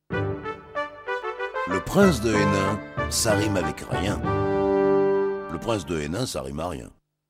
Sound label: jingle; supernana; princedehaynin